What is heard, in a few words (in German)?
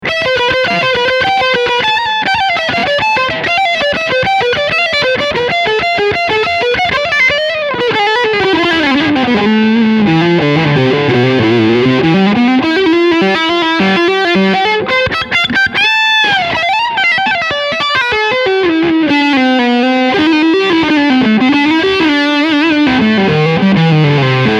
Leadsound Steg PU